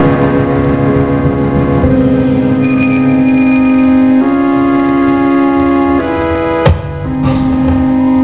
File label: rock